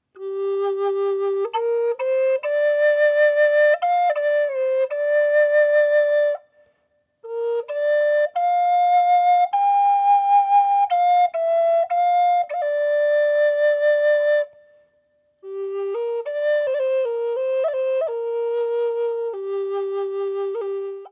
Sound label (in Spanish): tono G, flauta medicina roble; varias tonalidades